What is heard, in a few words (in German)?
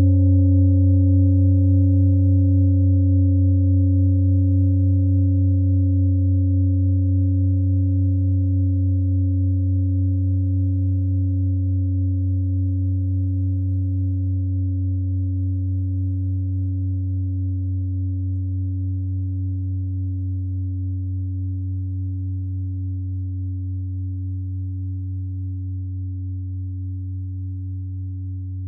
klangschale set; Klangschale Nr.1; Gewicht = 1750g; Durchmesser = 25,5cm; Höhe = 12,3cm